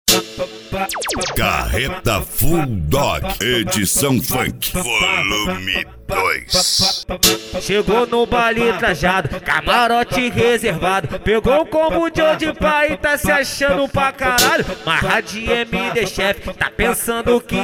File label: funk